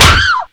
crit hit; slender

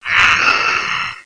slower attack; cof; suicider

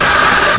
jumpscare; hoovy dundy

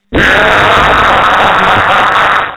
kill sound; zerosiki; slender

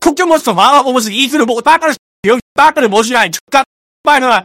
scout laugh short

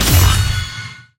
attack; zombie riot; hellagur

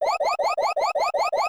shell red follow; tf2ware ultimate; mariokart

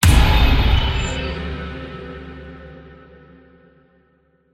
tremor burst

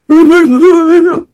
engineer pain severe

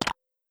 banana land, mariokart; tf2ware ultimate

